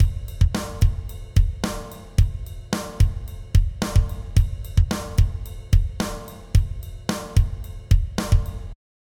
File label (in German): drums focusing eq passiv mit saturation keep lo; softube passive-active pack testbericht